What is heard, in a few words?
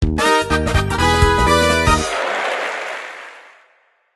Jingle - Quiz; Rhythm Heaven Megamix